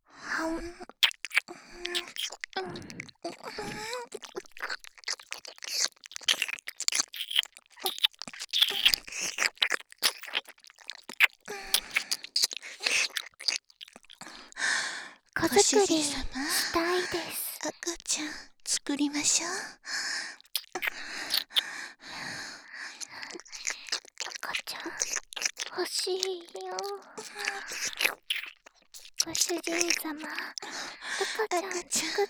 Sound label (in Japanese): 耳舐め; 赤ちゃん作ろ♪; ハーレムオナサポ 4人のメイドがご主人様のオナニーをお手伝いするバイノーラル～文、千曜、名月、莉桜～